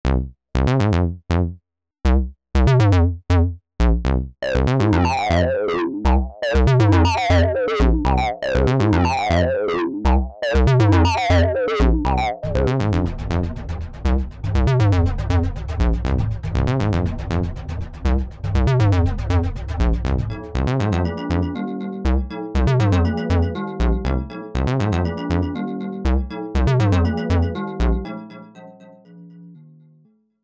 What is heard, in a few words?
Analog Bass; Singularity